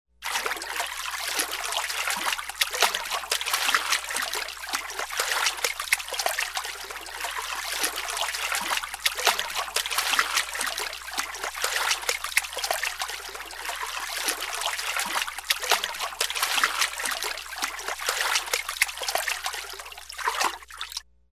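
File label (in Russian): хлюпают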